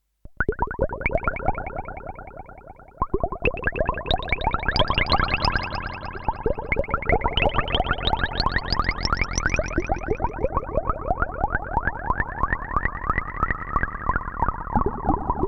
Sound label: minimax pads fx; plugiator